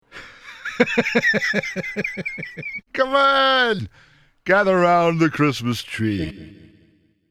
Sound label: christmas laugh